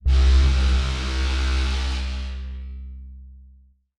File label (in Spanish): Barco